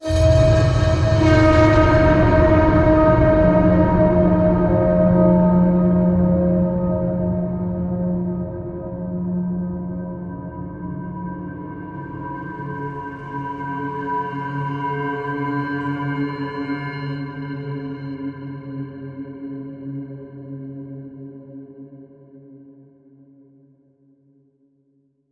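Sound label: creature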